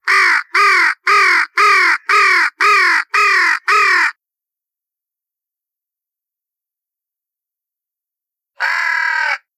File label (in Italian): Cornacchie